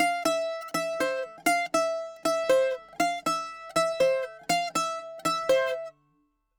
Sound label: bouzouki